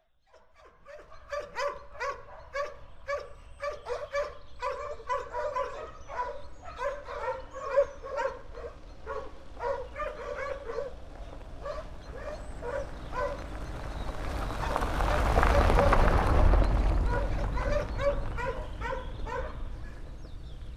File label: dogs and car